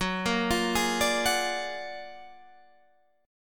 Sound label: Gb Augmented Major 9th Guitar Standard; Gb+M9 arpeggiated; JGuitar